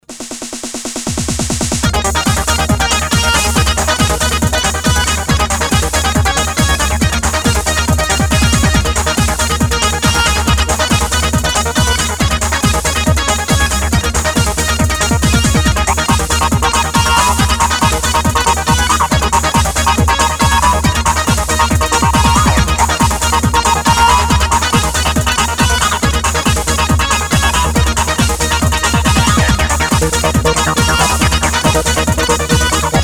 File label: indoor, progressive trance